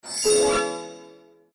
pairing match bonus both

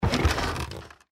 diving treasure pick up

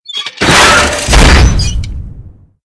big jump stomp; toontown-ceiling

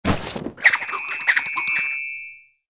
pick pocket

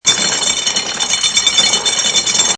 cage lower

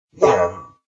grunt; Skel COG VO; Poodletooth-iLand

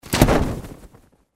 target chute

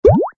ring get; Poodletooth-iLand; Panda3D

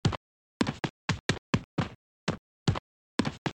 Win

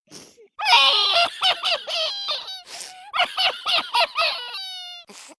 emotion very sad

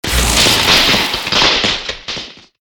sparks; Toontown Online

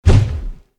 evidence miss

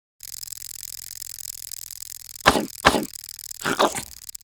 chomp; toontown-ceiling

